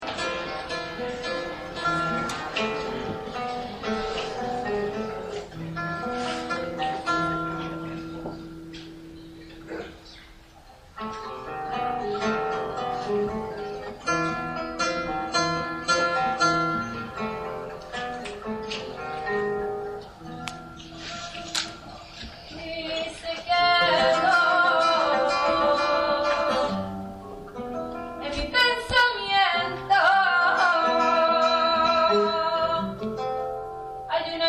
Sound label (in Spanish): Solea por Buleria; Concert